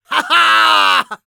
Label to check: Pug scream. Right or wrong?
Wrong